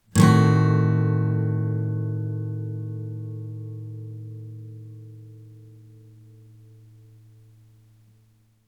Yamaha Adim

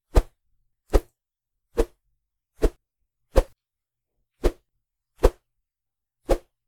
Medium Stick Whooshes

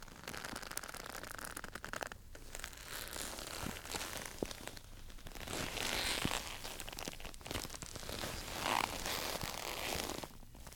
Walk With Leather Coat

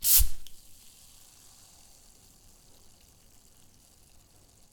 Bottle Open

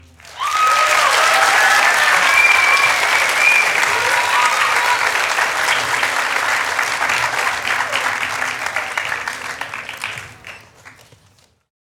Audience Clapping Indoor

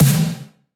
80s Snare

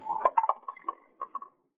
Drop object

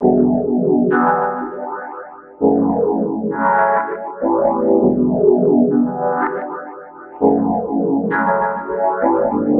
Lunar Vibes Melody

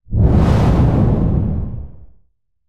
Wind gust

